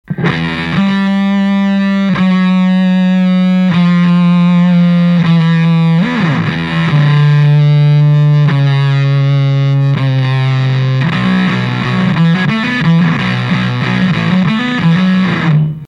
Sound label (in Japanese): FUZZ DEVIL ON, はい、歪みましたね。; はい、歪みましたね。完成だよ、完成。; FUZZ DEVILのセッティングは写真を参照してください。